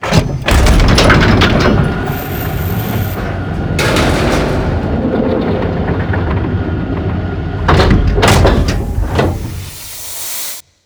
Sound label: undock; ships misc; Cosmic Rage